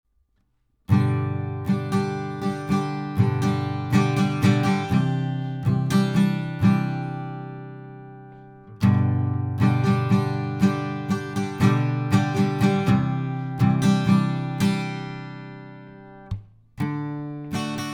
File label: Warm Audio guitar